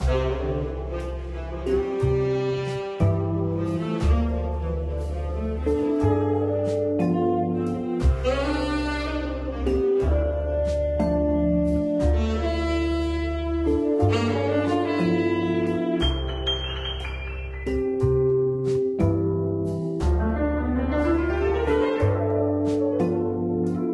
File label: music Room